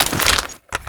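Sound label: weapon foley masada reload clip out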